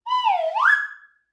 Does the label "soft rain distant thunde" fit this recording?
No